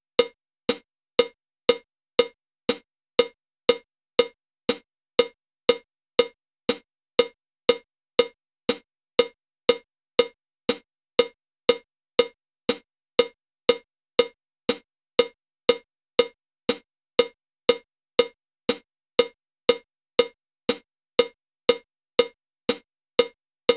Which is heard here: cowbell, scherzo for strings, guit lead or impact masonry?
cowbell